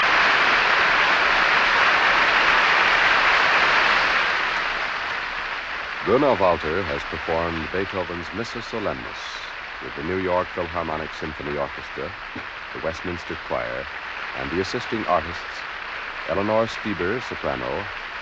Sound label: Applause and announcements; Mass in D major; Op 123; Missa Solemnis; Bruno Walter